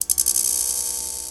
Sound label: Clicks Multi Tapped